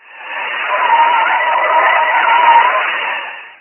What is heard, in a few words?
Skid; Buggy; PS1